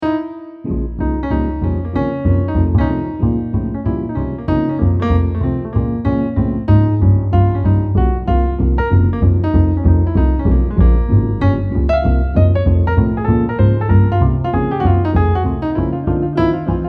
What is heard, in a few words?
Solo